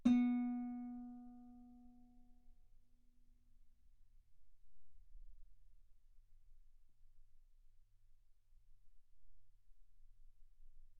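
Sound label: Guitar ff B string B3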